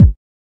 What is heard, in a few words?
Crunk Kick Drum